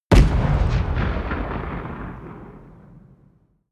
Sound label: missile medium explode distant